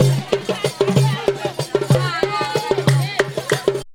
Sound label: KANKURAN DANCE; Best Service; World Colours